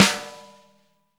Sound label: snare latin; Roland L-CD701; Snares 4; Sn Modules 4